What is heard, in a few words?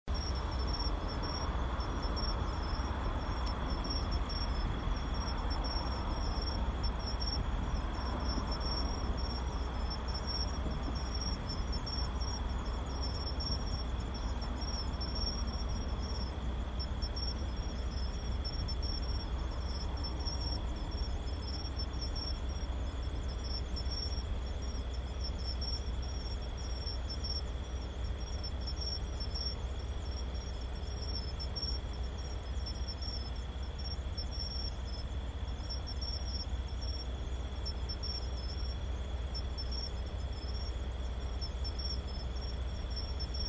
crickets